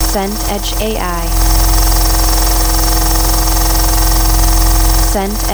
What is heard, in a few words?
scifi server ai core